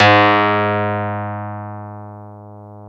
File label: DX EP; INIS; Vintage